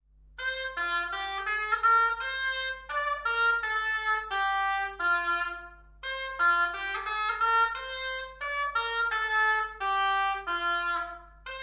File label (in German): Sound Oboe; blaeserjugend; musikmentoren